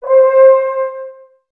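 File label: brass trombones C, Woodwind Brass Ensemble; 90 s Sample CDs; Miroslav Vitous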